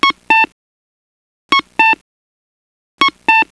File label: cuckoo